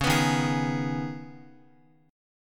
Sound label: C sharp-7th Suspended 2nd-C sharp-x,x,1,4,3,4-1-down-Guitar; C#7sus2; strummed; JGuitar